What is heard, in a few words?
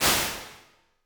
DRUM L480, Impulse Responses; Reverbs; PastToFutureReverbs; CLA; IRs